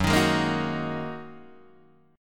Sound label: F sharp 6th Add 9th Guitar Open D, chord; 4,6,6,4,6,4